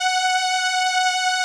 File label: SOLINA, String Machines